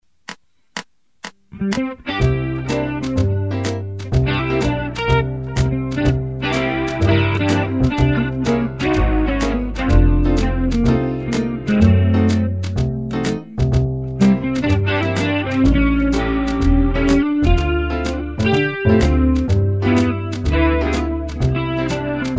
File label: Blues